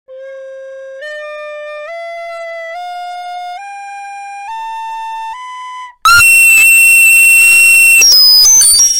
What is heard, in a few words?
recorder ear rape